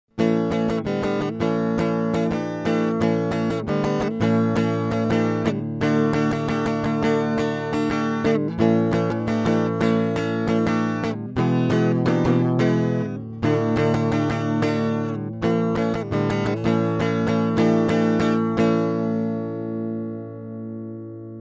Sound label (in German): Bus Exciter 104 E Gitarre clean